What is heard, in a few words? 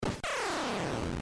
death; contra